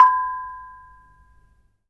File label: KGWGLK B5, kong wong lek; Spectrasonics; Heart of Asia